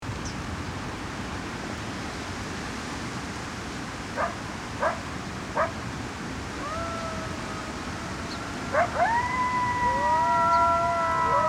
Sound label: family chant, coyote